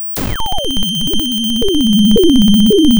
pop

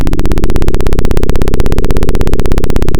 Sound of a plane taking off

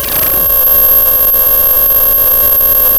Sound of a cat meowing

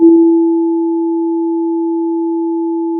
Sound of a church bell

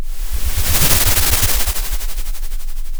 Sound of waves crashing on a shore

